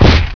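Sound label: gauntlet hit; weapons; Hexen2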